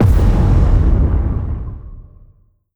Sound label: ion cannon fire; apocalypse